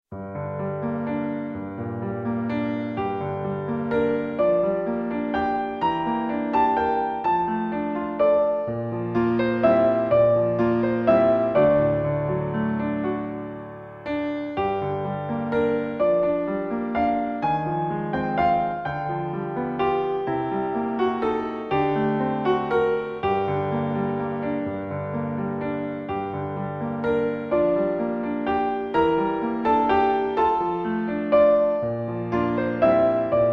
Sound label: Sicilienne Piano; Faure